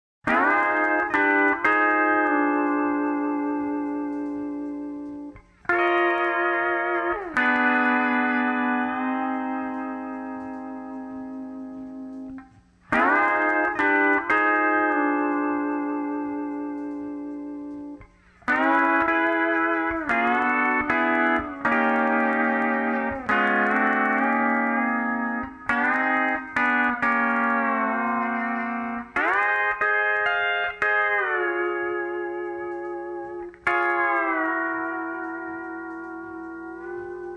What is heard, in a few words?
steel